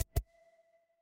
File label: ui beep